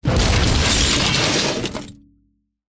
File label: impacts junk crash various metals glass wood slight distortion with resonating